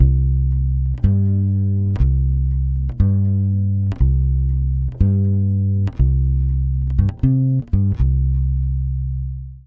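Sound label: 120 BASS C minor, Brazil Bossa; USB Soundscan; AKAI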